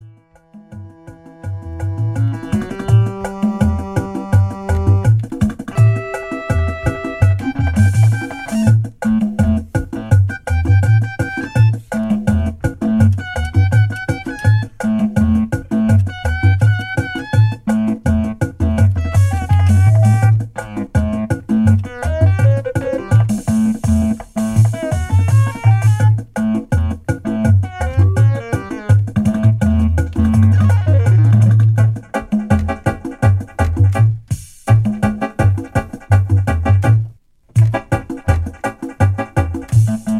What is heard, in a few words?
Organ